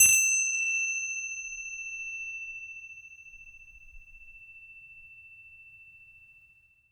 Indian Bell Hit Loop, Ethnic Instruments; Sonic Foundry (Sony Creative Software); The Electronic Music Manuscript Collection

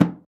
Dof Sak, Percussion; Roland BK-5

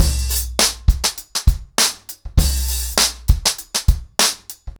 100BPM, ACOUSTIC DRUMS

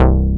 BASS, SYN BS; AKAI S6000 CD-ROM - Volume 1; SAMPLE WAVE MIXING 2; SYN BS #3

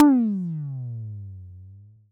TD-20 Tom A, Roland TD-20 Toms

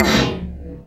HAMA FLANGE, HAMMER PERC; Zero-G Ambient 1

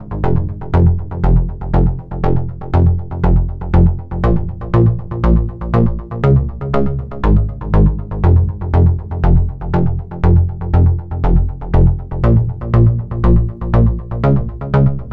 NRG Bass, Trance

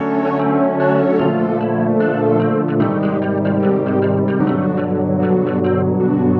Synth Break, Cinematic Funk; Sonic Foundry (Sony Creative Software); Cinematic Funk Urban Tone Poems

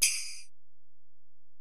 Ac Perc, Drum Machines Alesis DM-PRO

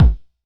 Pound Uppercut, Kicks; Airwindows

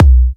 Kicks B; markus hakala

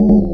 Odd Voc C2, Club Techno FX; Zero-G

